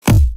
low attack wake

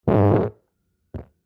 diarrhea fart

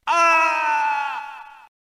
falling scream; reinhardt schneider

